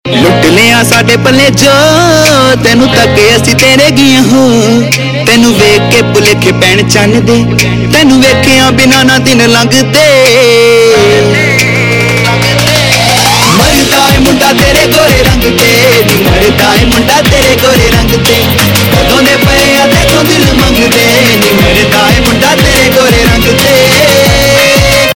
Ringtone, Punjabi Pop

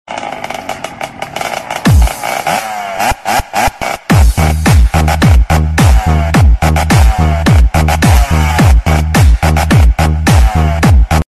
Bike Dance Music Scooter EDM Ringtone

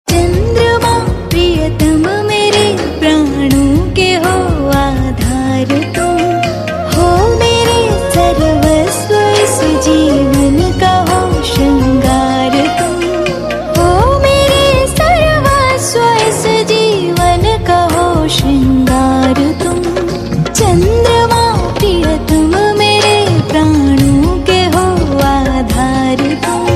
Chandrama Priyatam Mere, Ringtones; TV Serial Tone; Life OK; Devon Ke Dev Mahadev

